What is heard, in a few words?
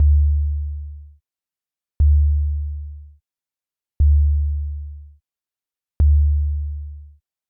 sub kick vco no attack; waves element